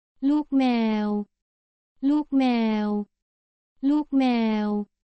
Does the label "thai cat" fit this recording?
Yes